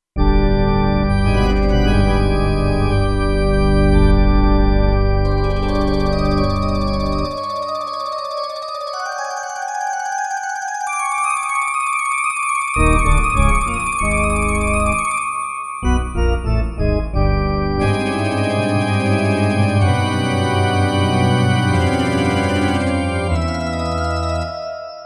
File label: Haunted House